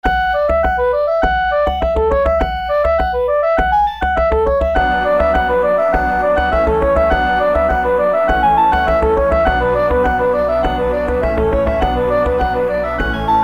Traditional Reel, Flute, Recorder, Mandolin; VST; DAL Flute; Banjodoline; Syntheway

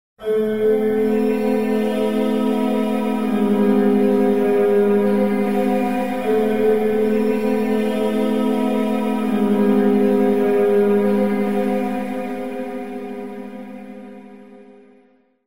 Psychoacoustic Cosmos Reverb, Wet; Virtual Room Reverb; Syntheway

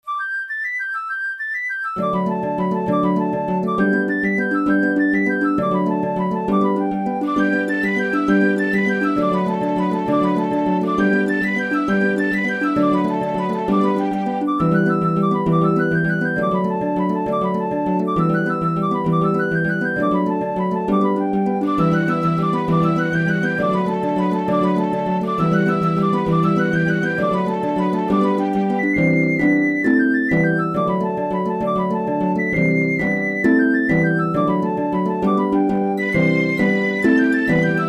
Strings Flute Harp; Virtual Harp; Syntheway

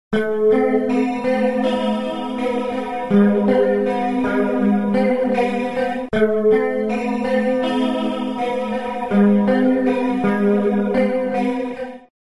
Chorused Vox, Guitar Wet; Vox Quantizer; syntheway

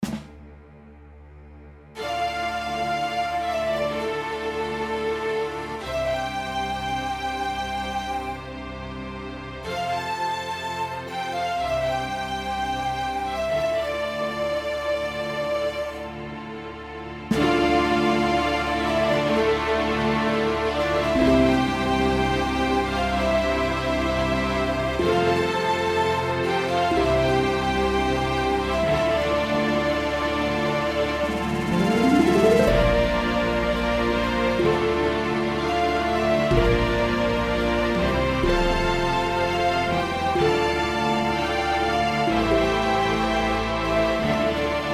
Strings, Flute, Harp; Chordophonet; Syntheway

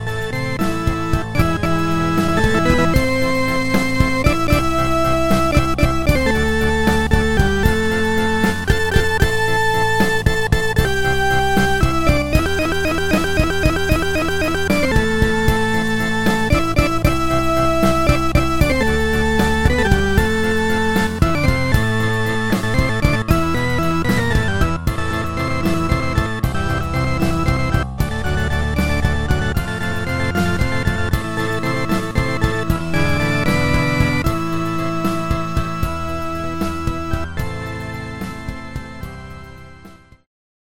Elka Panther Transistor Combo; Organux

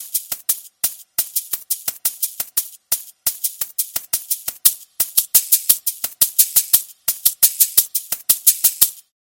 Latin American Shaker; Drumwavy; Syntheway

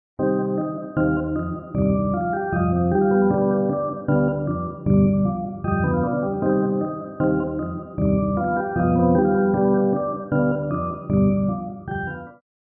Rotary Speaker Distant Miking Organ Wet; AmpVibe; Syntheway

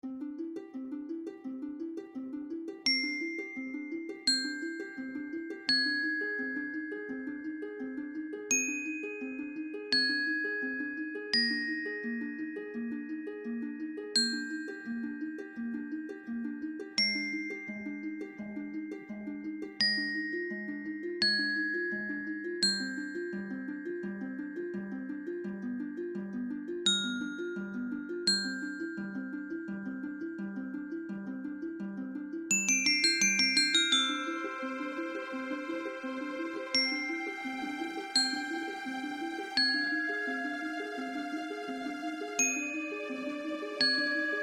Harp Strings Brass Glockenspiel; Chordophonet; Crystal Records; Syntheway

